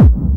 kick

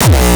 kick; shock. Ultimate Kicks vol. 2

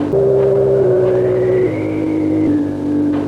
Sci Fi Org and Storm; Sound FX

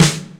Snare, Yamaha RY-30 SNARES; Drum Samples

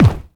Afro feet, Roland MC-303 Bassdrums

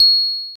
Pling, Roland System-100; Drum Samples

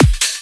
oonce

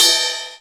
Ping, Drum Samples Linn 9000

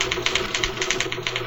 Typewriter Groove; Sound FX

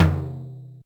Yamaha RM 50 Toms

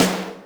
snaredrum, Yamaha RM 50

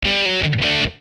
Punk slide open; Nokia Ringtones; Audio themes

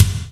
Gate kck, Roland JD-990, Drum Samples

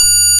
Tubalar Bell, Korg M1; Drum Samples

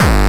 bombo, kicks, Hardcore

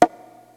Conga, Roland D-110; Drum Samples

